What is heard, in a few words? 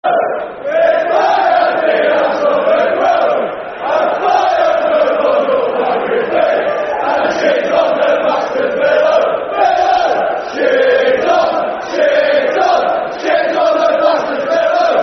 Football Chant, Soccer Songs; If I Had The Wings Of A Sparrow; Scunthorpe United Scfc